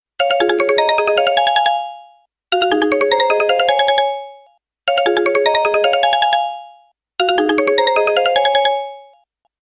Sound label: xperia z tone